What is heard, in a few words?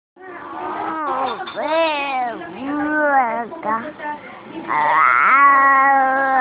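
baby hungry, male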